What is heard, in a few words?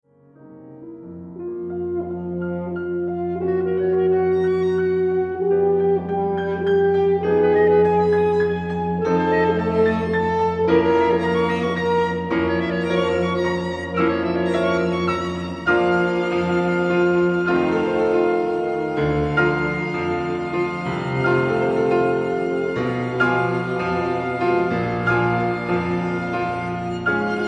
Quintet; Fibich